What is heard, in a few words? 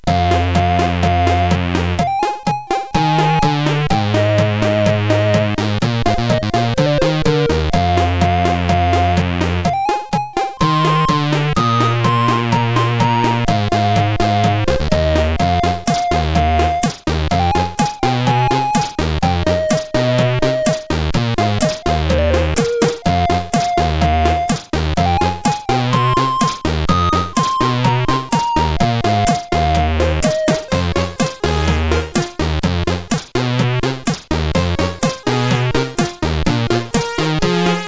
sid, CSG8580; Hit-Points